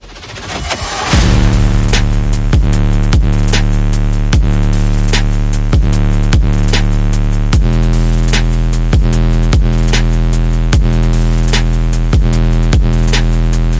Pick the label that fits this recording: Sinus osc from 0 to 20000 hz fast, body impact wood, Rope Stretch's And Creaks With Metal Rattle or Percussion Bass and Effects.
Percussion Bass and Effects